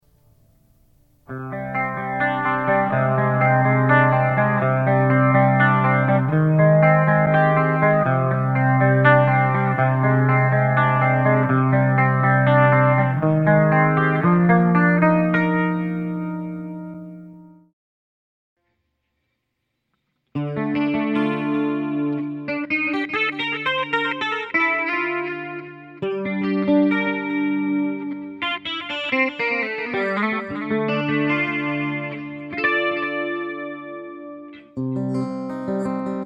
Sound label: ME50 clean to crunch clean